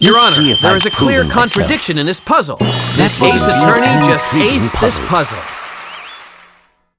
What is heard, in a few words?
win